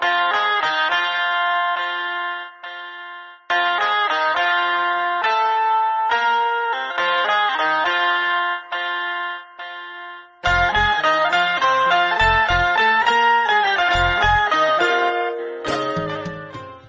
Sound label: Flute In Marathi Ringtone; Ganpati Bappa